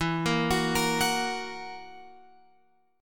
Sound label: E Suspended 2nd Flat 5th E down Guitar Open F; arpeggiated; JGuitar